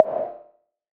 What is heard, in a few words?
rim, gated reverb; musicradar